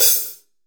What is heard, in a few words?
14HHCLSD HL, drums hi-hats; Best Service; Real Mega Drums VOL-1